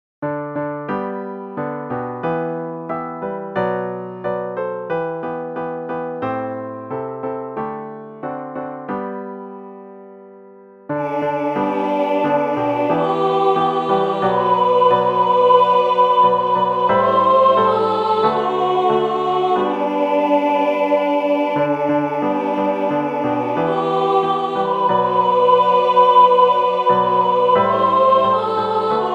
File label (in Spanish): contralto; Cantata para SATB, Solistas y Narración